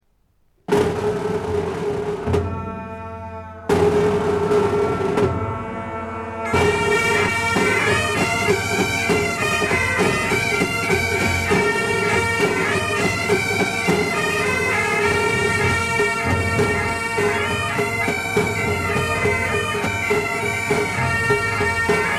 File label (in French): Marche héroique